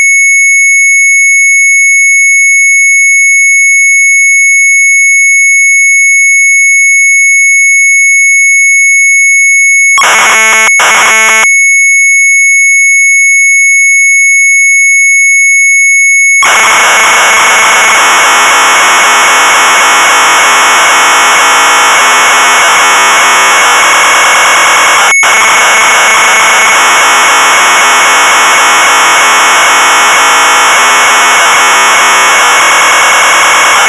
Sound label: Sharp MZ800 Tape; retromelsarchive